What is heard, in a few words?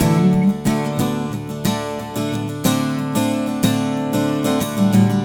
Acoustic Guitar; Guitars